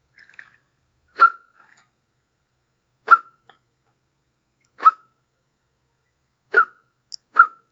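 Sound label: punching sound, action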